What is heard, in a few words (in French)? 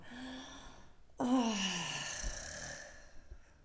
soupir; Index des soupirs; Hadjithomas Joreige